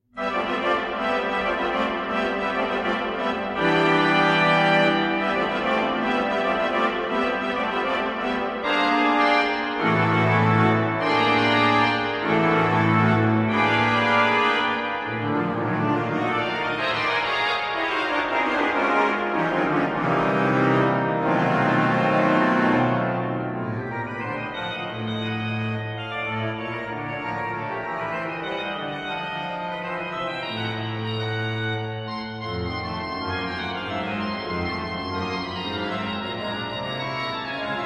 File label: Grand jeu; Laukvik